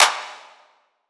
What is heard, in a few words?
clap draco; Drum One Shots; SD MIDNIGHT Sample Pack; Slate; Claps